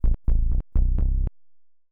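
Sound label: synth bass, house, 125, A; eJay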